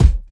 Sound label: subby kick drum one shot c sharp key; kick drum samples